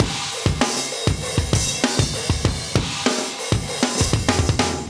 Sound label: 98 live breaks